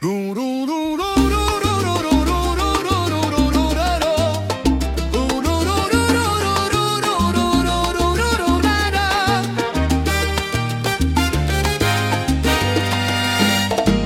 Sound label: SALSA